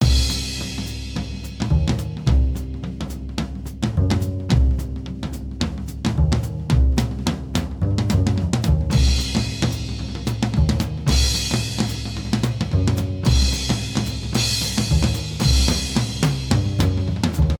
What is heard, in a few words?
In Game Song Loop; MonoGame.Samples